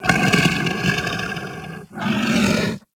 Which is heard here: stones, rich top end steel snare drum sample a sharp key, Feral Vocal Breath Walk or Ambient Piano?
Feral Vocal Breath Walk